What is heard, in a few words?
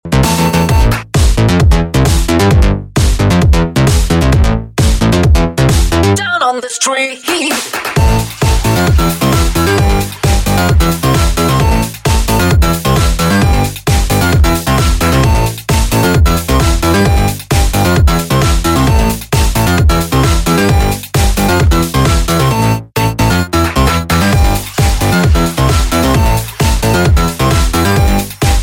Speed Electro